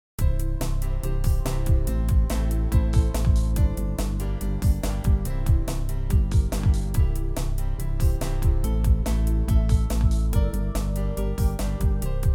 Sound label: 90s POP Exciting; amperAI